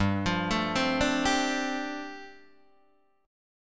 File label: G 9th Suspended 4th, Guitar Open D